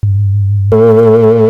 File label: GRITTY; SP1200; E-MU Producer Series Vol. 8 – Platinum Phatt; PlatinumPhattCD1